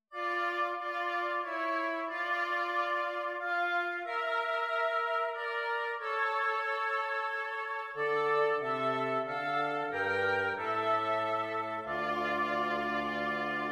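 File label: Coventry Carol oboe oboe bassoon; Christmas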